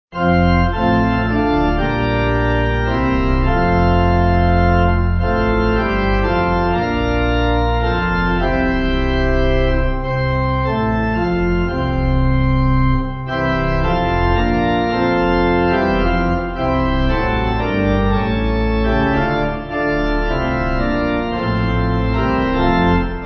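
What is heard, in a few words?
Pipe, Pipe Organ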